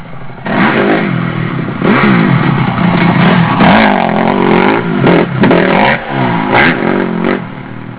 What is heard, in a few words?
crf250